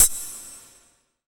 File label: FX Dance